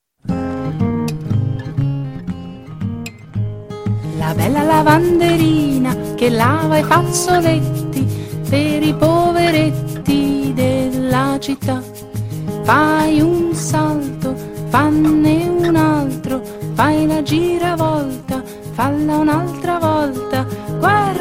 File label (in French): La bella lavanderina, ITALIEN